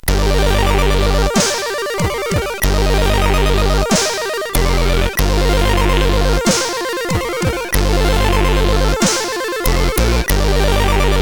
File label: sid CSG8580R5; Transfer